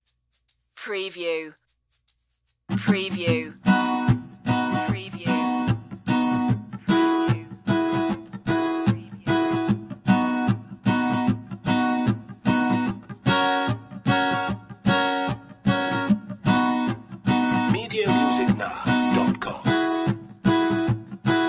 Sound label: no whistling